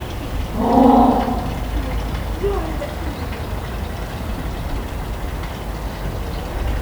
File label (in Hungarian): mindig csak egyet, pápaszemes pingvin; marantz puska; debreceni zoo 2012